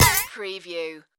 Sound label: funny game menu select